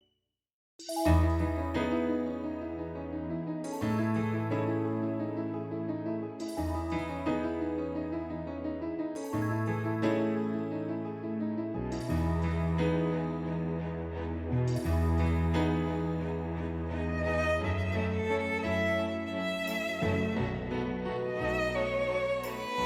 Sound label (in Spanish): Violín Magico